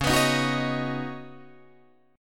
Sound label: C sharp 7th Suspended 2nd Suspended 4th C sharp down Guitar Drop D